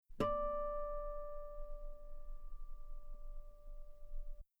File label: guitar D5 very long forte harmonics; bananenkeyboard